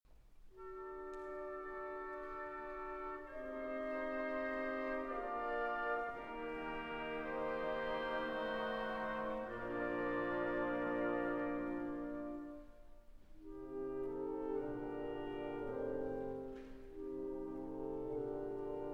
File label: Concerto For Violin and Orchestra in D Major, Canzonetta - Andante